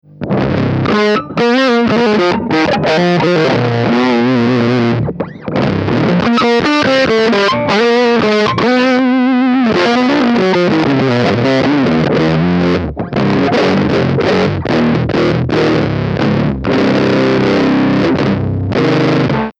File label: bassy; Fuzz Face - low